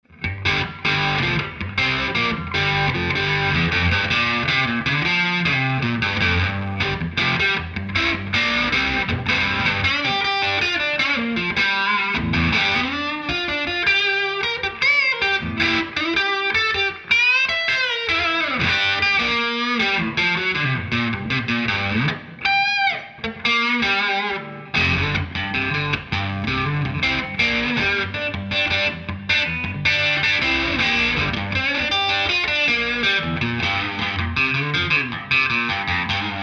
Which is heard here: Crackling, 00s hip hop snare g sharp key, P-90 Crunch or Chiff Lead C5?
P-90 Crunch